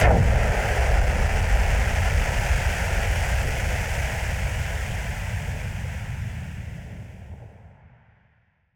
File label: Noise Bomb Boom Filter; musicradar; bomb factory